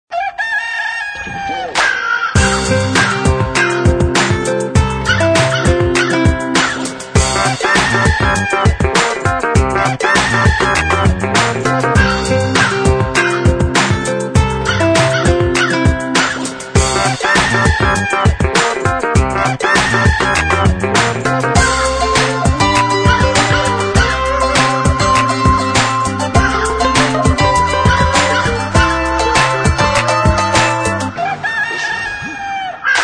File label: Crow Hip Hop; Music Ringtone; Musical (Sound Effects:Ringtones)